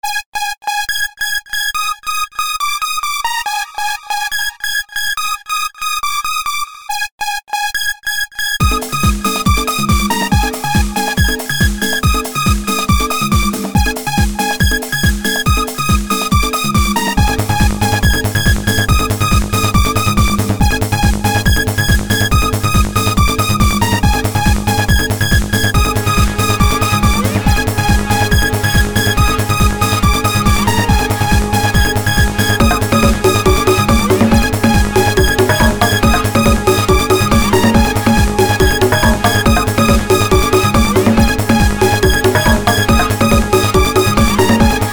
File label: electro; a la decouverte de la classe mao depaignes